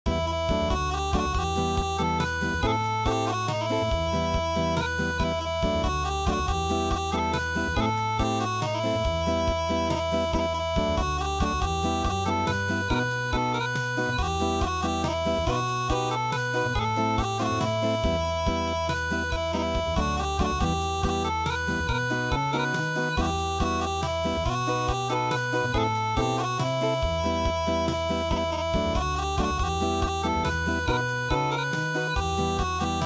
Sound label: Garba Dance Song Acoustic